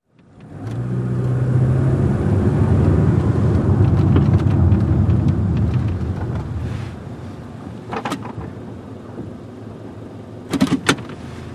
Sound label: Sedan Acc Park Off